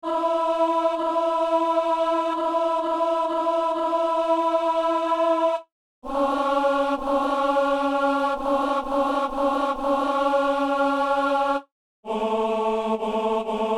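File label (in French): ténor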